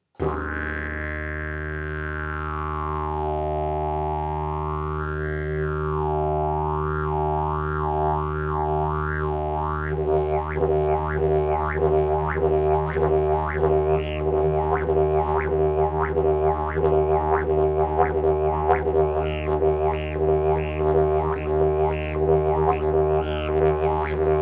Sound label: didgeridoo; jilm jesterak